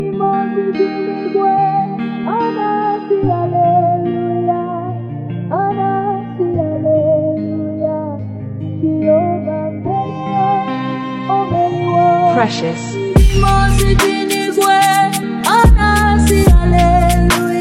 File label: Drill Refix